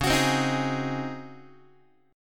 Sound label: Db Major 7th Suspended 2nd Suspended 4th Db Guitar; x,9,9,10,7,7; DbM7sus2sus4 strummed; JGuitar